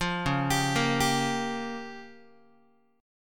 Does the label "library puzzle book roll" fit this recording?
No